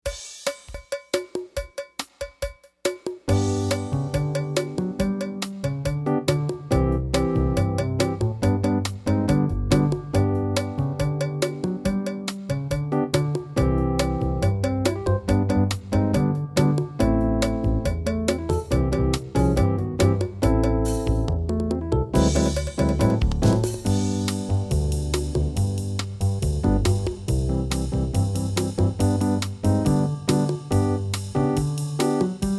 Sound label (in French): pièce flute